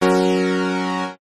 multimedia game sound basic digital retro fanfare level complete achievement